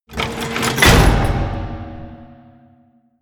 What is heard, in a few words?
mechanical lever metal large up